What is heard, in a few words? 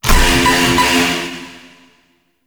Vaults Vault Alarm On Off; Gameplay; Warframe; Entrati